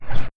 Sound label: baton swing; triple-aaa-games; infantry